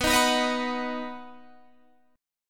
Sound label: B Major B down